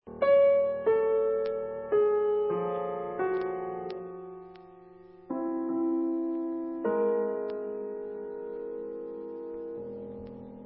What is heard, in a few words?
Danish Piano